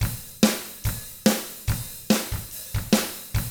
ROCK, Double Platinum Drums

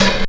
Open Hihat; Sonic Foundry (Sony Creative Software); Stylus Pressure Urban Grooves on Digital Wax; Down Time

